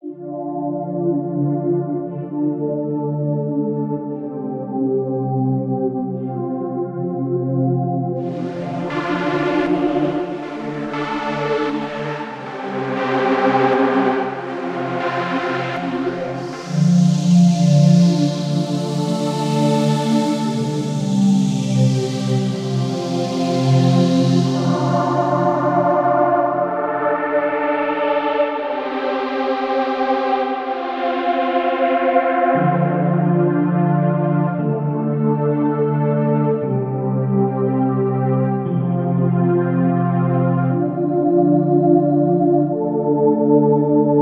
pads

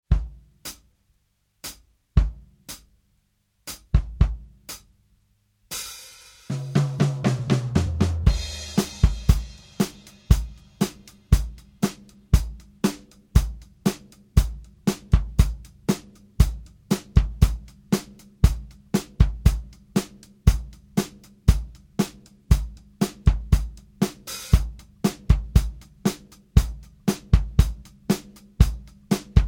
118 70s pop rock

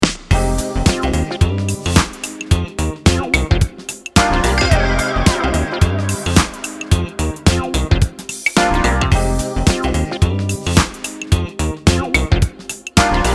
funk synth

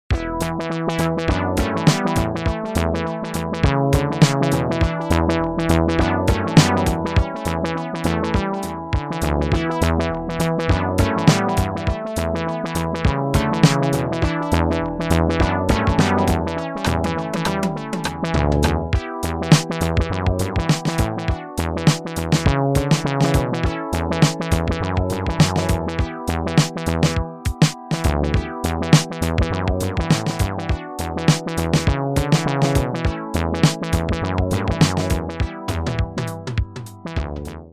roland jx-3p juicy funk

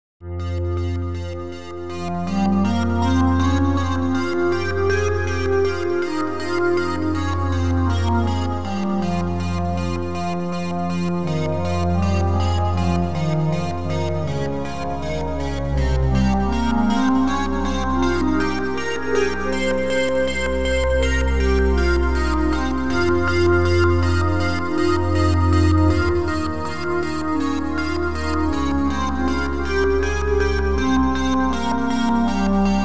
pad 4 voices day and night

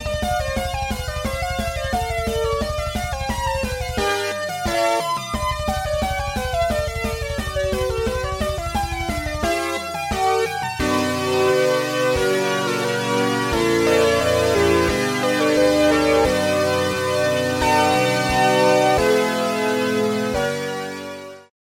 lead fusion waves

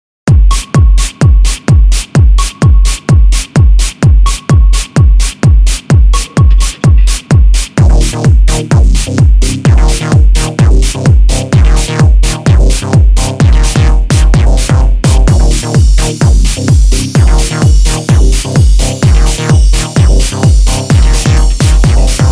big kick; florian meindl

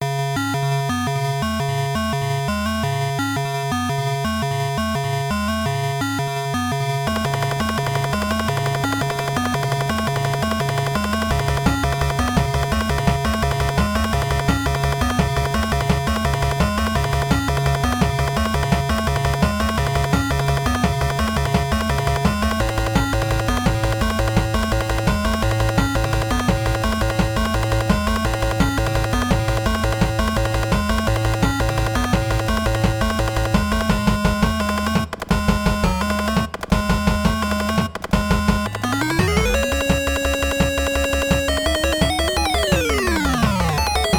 chiptune kit

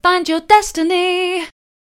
ping pong delay dry

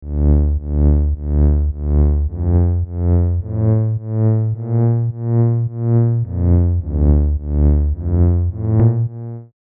serum basses; chiqui audio

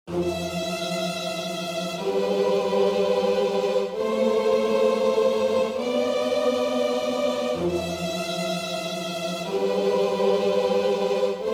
strings

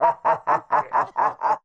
evil laughter; nova sound

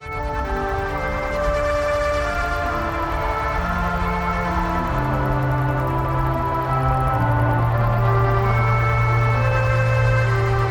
granular dirge

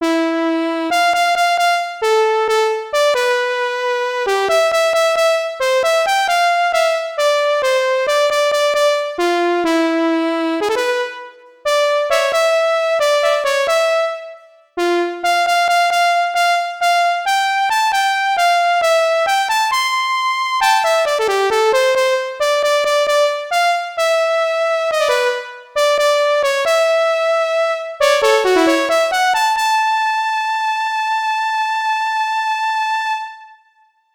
horn

